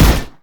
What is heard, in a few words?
alien rifle; Weapons; EK modern; Barotrauma